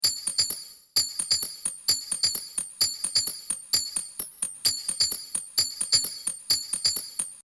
cristal percu; Loop Mania Sampler Pack

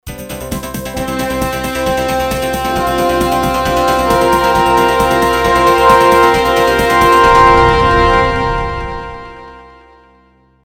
News Jingle; Jingles for films podcasts

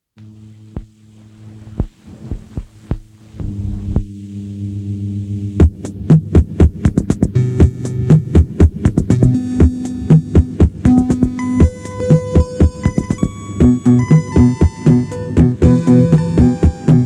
lofi synthpop; elated; Filmmakers Reference Kit Volume 2

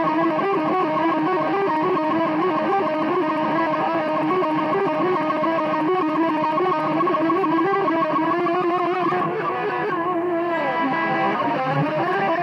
guitar impromptu

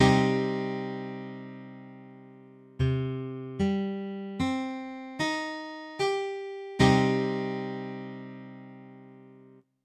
C Minor, Guitar Chords

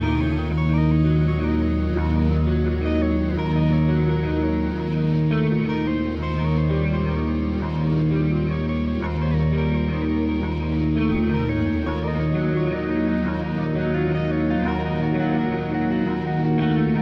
Spacious Echo Journey all guitar; CUES for film TV games etc

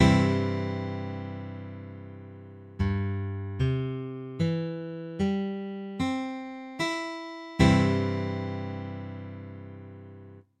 C G, Guitar Chords

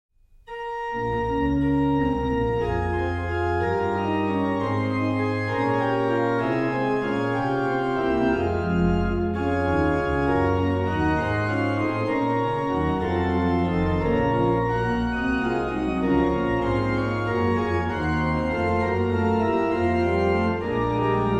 Bach Organ Works; BWV 626 Jesus Christus unser Heiland der den Tod berwand; Orgelbchlein

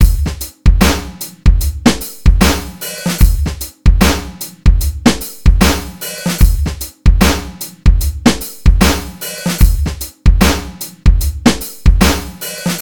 Breaks, 150bpm; Kizes Cut-up Breaks

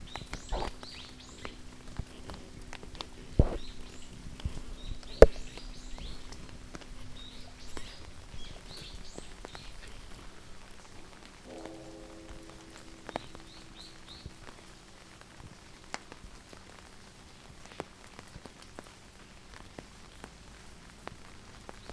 Rain on umbrella, binaural, Japan; Japan by Ear

